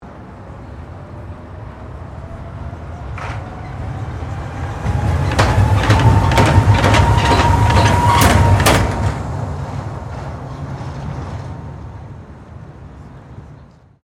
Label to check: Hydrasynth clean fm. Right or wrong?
Wrong